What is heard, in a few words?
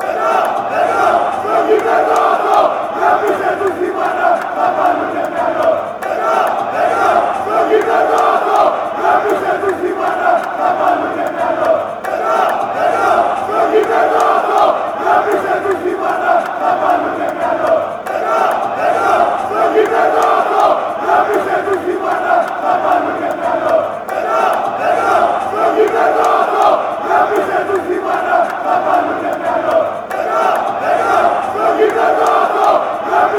olympiacos chant; ofc